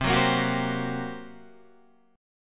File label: G Minor Major 7th Double Flat 5th, C